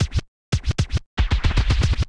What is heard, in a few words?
All BPM Style Dholki Beat